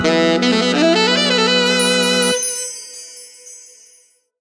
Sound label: (Jingle) Minigame [Complete]; Mario vs. Donkey Kong